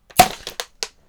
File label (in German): Bier plopp